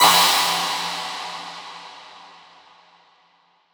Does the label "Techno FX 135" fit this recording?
Yes